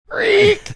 imitating microphone feedback

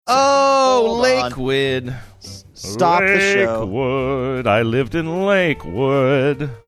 saying singing oh lake wid lakewood

